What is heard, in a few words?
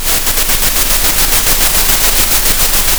pop, Sound of a train